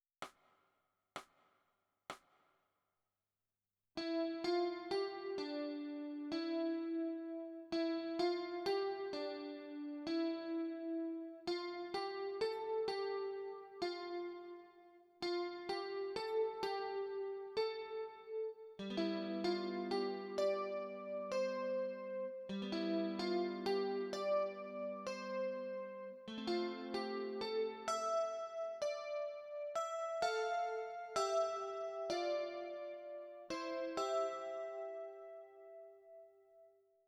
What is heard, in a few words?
Chorus Guitar